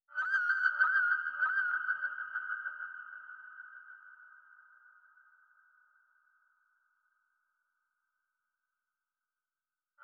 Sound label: 97 BPM F# Min Siren, West Coast; Loop Stems; Melodies; Cymatics; GHOST (Beta Pack); Alley